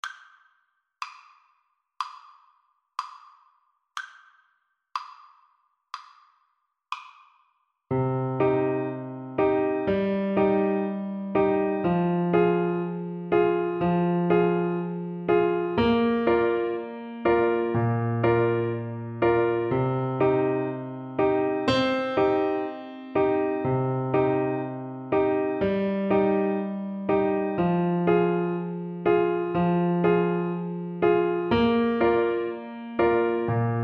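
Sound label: horses alto sax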